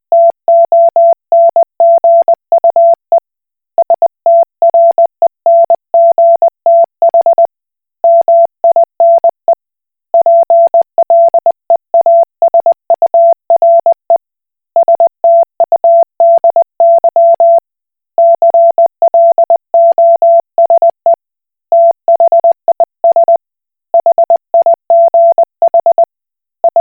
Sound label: Koch Morse